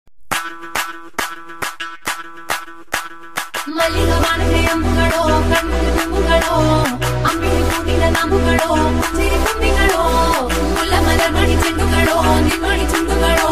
ringtone, Malayalam ringtone, love ringtone, remix; Pushpa 2 The Rule